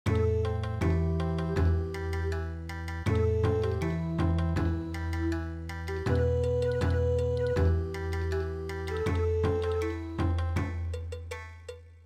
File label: Bon Odori